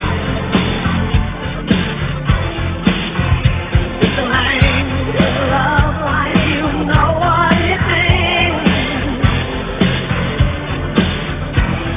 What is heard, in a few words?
loop